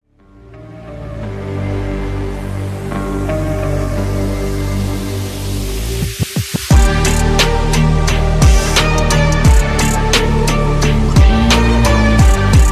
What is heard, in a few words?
Instrumental Ringtone